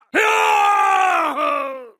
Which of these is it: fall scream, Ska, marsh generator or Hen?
fall scream